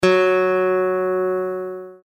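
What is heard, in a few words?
F sharp, guitar, open D tuning; Use the audio tones below to tune your guitar to an Open D Tuning; 3 f sharp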